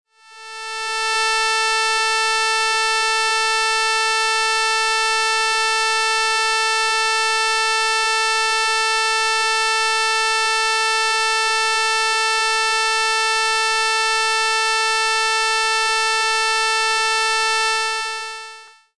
violin A 2nd

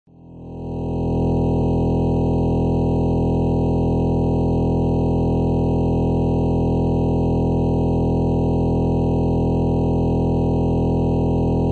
double bass E 4th

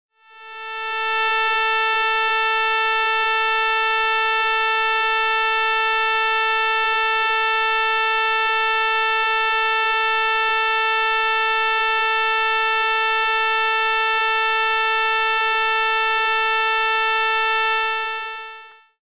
viola A 1st

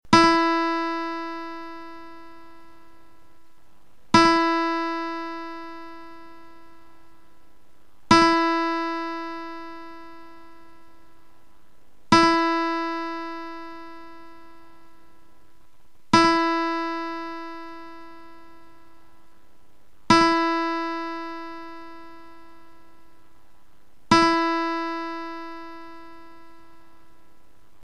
E 1st string Guitar

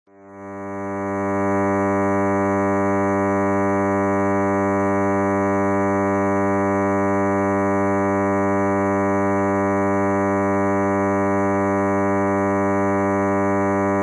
cello G 3rd

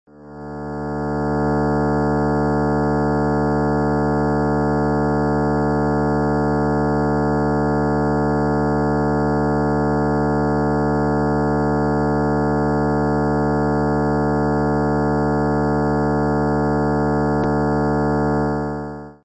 double bass D 2nd

